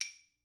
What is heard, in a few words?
claves mf; Idiophones; Struck Idiophones